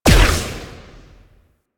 Disc Shot Explosion; Disc Moa

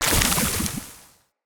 Claw Proj Impact; Enemy; Corrupted Sproutfolk; Combat

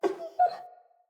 Wolf Cub Trapped Call Close; Vocals; Animals

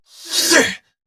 Attack Effort

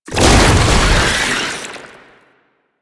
Tentacle Flail Stop; Enemies; Abilities; Warframe; Proto Infested; Babau

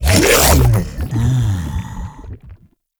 Grendel Devour Impact; Warframe

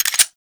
Electro Mag Mech B, Corpus weapons; Warframe

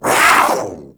Kavat Vocal Attack Short; Warframe; Warframes; Khora

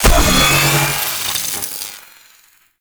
Sniper Charge Up, Weapons; Warframe; Sentient; Caliban Prime Sniper

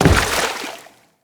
footstep in water, enemies; Footsteps; Grineer

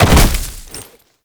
Land Heavy Vegetation, movement; Warframe; Uriel

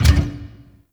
Gear Shift Down, Motorcycle; Warframe; Vehicles; Motorcycle Ninety Nine

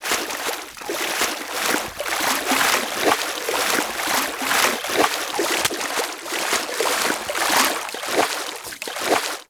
Horse Steps Gallop Loop Water; Warframe; Duviri Horse; Vehicles

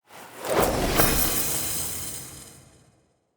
Potion Smash Throw

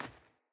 Sandals Run Dirt, Footsteps

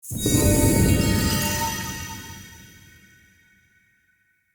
Light Mirror Beam Hit Positive Target C; Puzzles

